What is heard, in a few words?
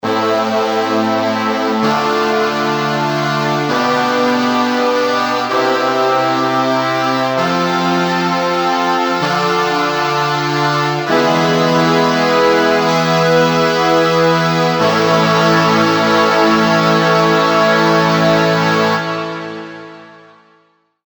memotron brass studio; manikin electronic; gc3 brass (studio)